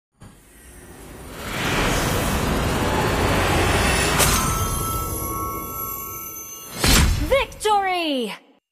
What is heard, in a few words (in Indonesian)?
Nada Dering Victory; Mobile Legend